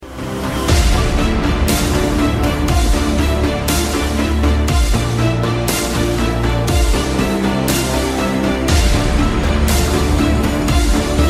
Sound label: EPIC MUSIC